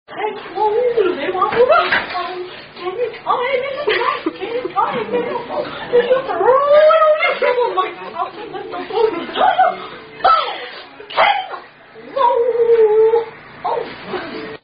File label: Do Your Balls Hang Low [Tune Turkey In The Straw]